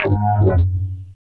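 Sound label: synth, 170 BPM, Drum & Bass; Ueberschall; Drum & Bass Flash Trackz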